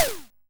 Ym Multi Tom F2; multi-samples; CHIPSHOP DELUXE

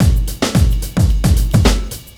Beat 110bpm; musicradar

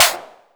Vermona Snare; techno drum fx; Analogue DrumHits

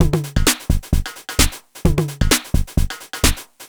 Beat (130BPM), techno; techno drum fx

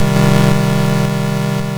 YM Chord Gm; ChipShop Deluxe; ChipShop Hits

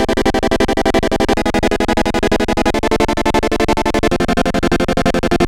Chord Am, 175 BPM; loops; CHIPSHOP DELUXE

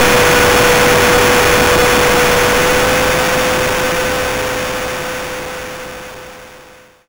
YM Noise; NOISES; ChipShop Deluxe; ChipShop Hits

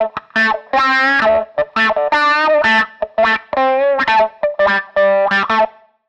Guitar line, 85bpm, hiphop; musicradar

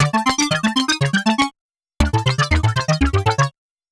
Tech Synth, techno 120bpm; musicradar; Synths

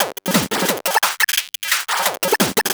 PO Drums, 175 BPM; ChipShop; CHIPSHOP DELUXE; CHIPSHOP LOOPS

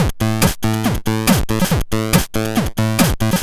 140 Synth A, 140 BPM; loops; CHIPSHOP DELUXE

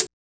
Closed hat, techno drum

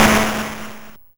Snare, YM, CHIPSHOP HITS DRUMS; ChipShop Deluxe

